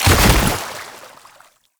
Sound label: Body Fall Light Water; Enemies; Warframe; Tau Dax